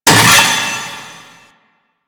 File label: Vaults Stake Gate; Warframe; Entrati